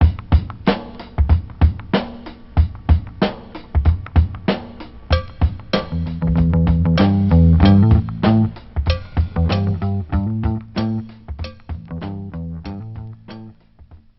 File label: groove,drum loops